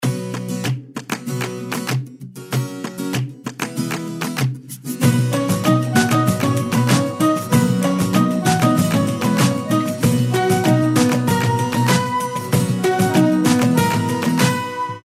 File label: Ring; Aio Wireless